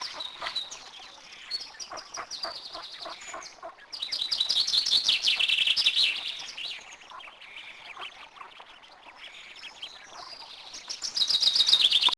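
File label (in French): canard oiseau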